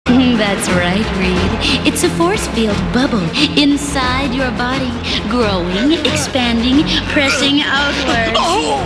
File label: bubble